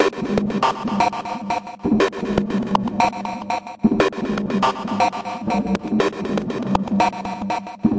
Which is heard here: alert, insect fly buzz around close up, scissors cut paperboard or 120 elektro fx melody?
120 elektro fx melody